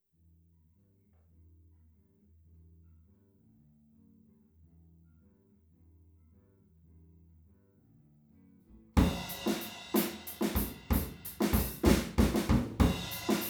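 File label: PZM Omni Drums, stereo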